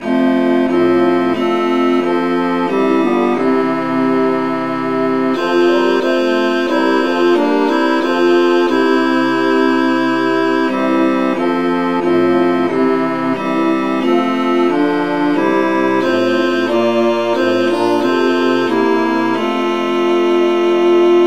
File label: traditional, key F